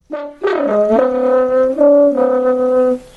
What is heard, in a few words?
trutruca